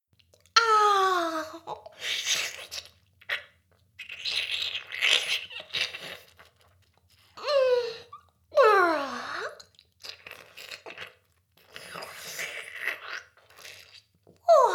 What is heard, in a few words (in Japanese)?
BGM・セリフなし お掃除フェラ; 魔法少女マスカリマッスル; トレーニング後には…お掃除フェラ♪